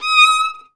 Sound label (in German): GEIGE, Alpine Volksmusik